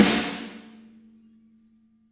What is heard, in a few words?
Snare Hard